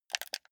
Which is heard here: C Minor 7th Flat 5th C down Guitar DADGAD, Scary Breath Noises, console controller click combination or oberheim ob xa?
console controller click combination